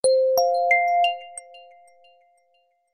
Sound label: SMS soft bells